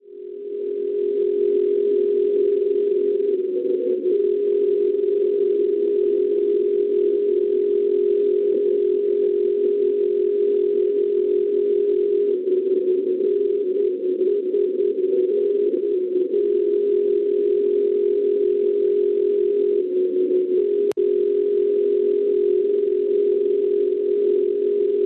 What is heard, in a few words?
NDB Niederstetten Germania 311 kHz; NSN; Schwarzsee